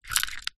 leisure toy game water ring toss handheld button press